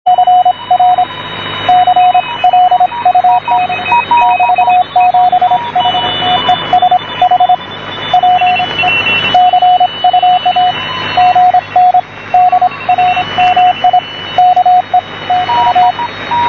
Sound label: DCRX CW; juma rx1